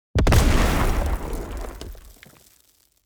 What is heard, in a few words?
zone explosion